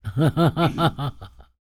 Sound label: LAUGH, Jazz FX; LAUGHS; Spectrasonics Vocal Planet